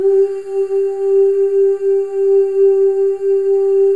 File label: FEM OOS, Symphony of Voices